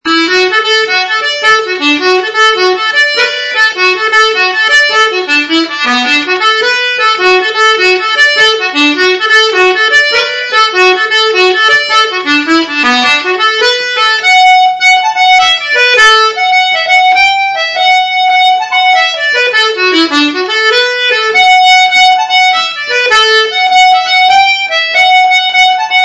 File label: Jig